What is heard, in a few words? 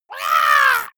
monster painful cry high